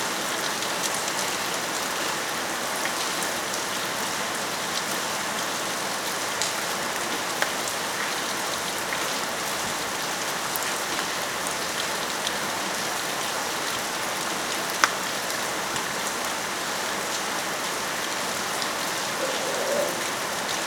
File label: Rain